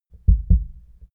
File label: heart beat double